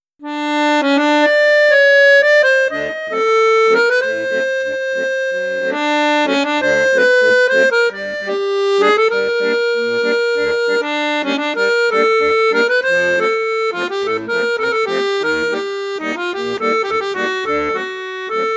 registre basson, accordeon; accordiola

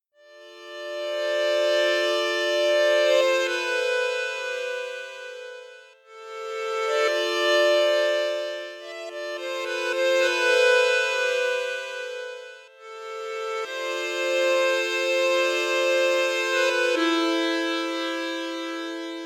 registre flute juste flute haute, accordéon; saltarelle

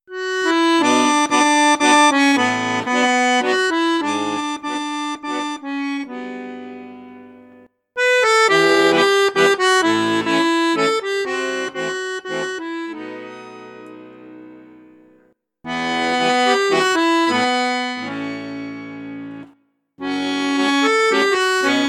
registre basson piccolo, accordéon; cavagnolo professionnel 12

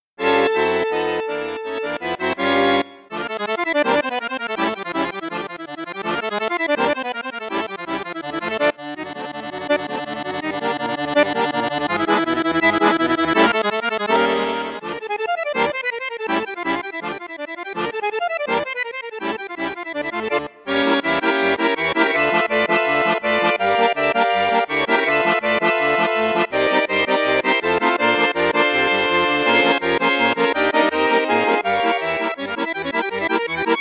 registre basson flute juste flute haute piccolo, saltarelle accordeon

